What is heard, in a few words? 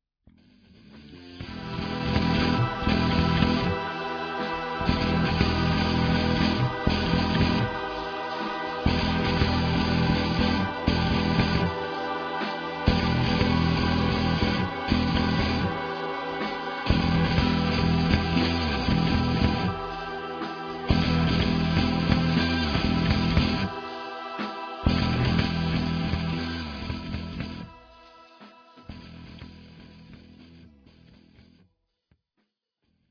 jam